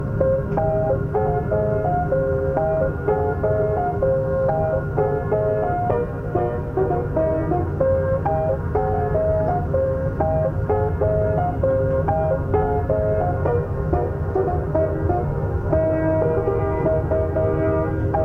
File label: thunder stuff